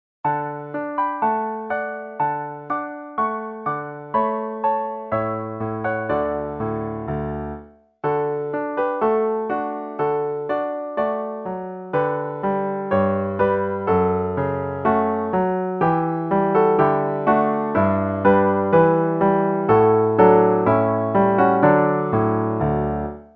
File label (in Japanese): bansou